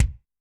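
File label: bass drum, Kick, Yamaha DTXpress-II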